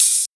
original open high hat sample d key, Royality free open high-hat sample tuned to the D n